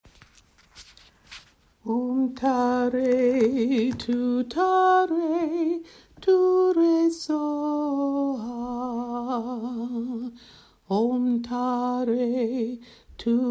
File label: Triple Compassion Chant; OaxacaCanta